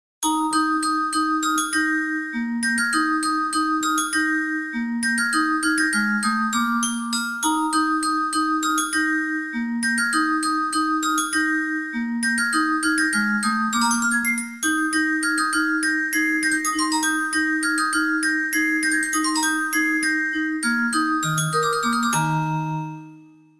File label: grand classic